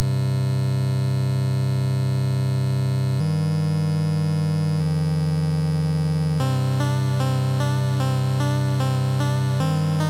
Music Theater

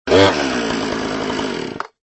Saw Sound

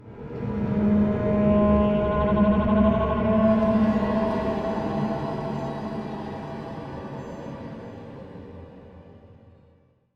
Music Shorts, Before action

